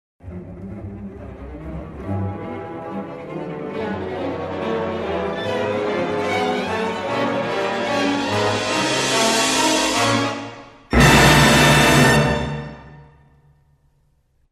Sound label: Dramatic Cue; Ren & Stimpy - Production Music